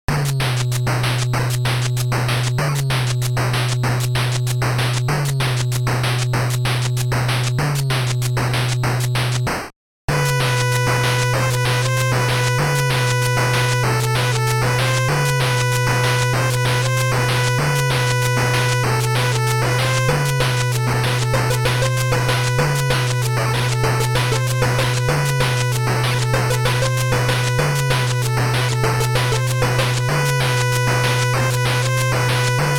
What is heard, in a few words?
breakbeat; famitracker; breakbeat jupiter